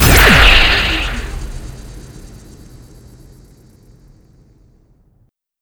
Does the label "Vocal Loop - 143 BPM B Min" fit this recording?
No